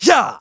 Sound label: Vox Attack; Riheet; kingsraid